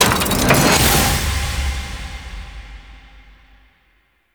Loot Box Open; Baro Ki Teer; Warframe

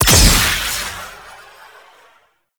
Projectile Fire; Warframe; Cephalon Weave

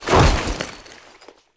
Foley Melee Sweep; Warframe; Kahl

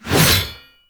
Heavy Scythe Whoosh; Warframe; Duviri Heavy Scythe

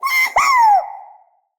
Trapped Sprout Falling Vocal; Friendly; Sproutfolk; Fey Hub Sprout

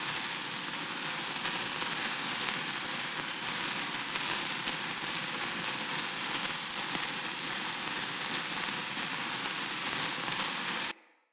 Cannon Projectile Loop; Weapons; Player

